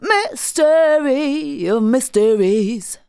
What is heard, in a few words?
vocals sung; Techno Trance Essentials